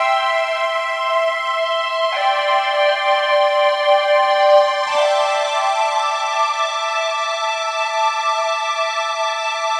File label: Pad